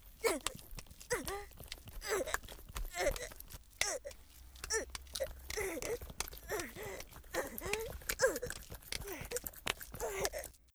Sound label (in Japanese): ぐっ